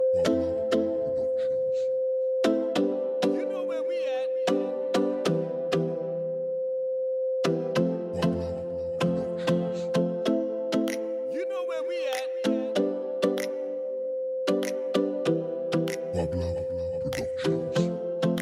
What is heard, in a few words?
Latin Reggaeton